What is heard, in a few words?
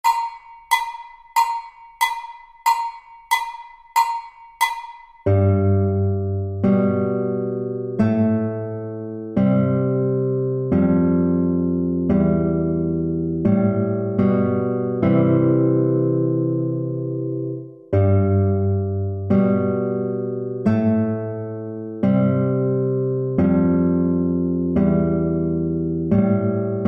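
Round the bend accompaniment, guitar; B string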